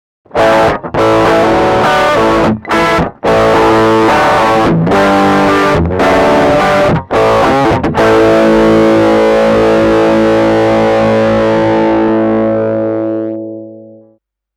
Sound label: Guitar Crunch Tube